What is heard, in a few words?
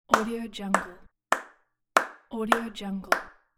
hand claps